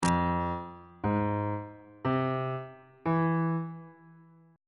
f harmonic minor 7th chord 1 is f minor major 7th on bass clef